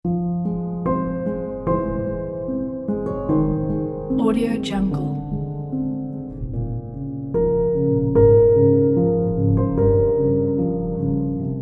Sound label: the sad piano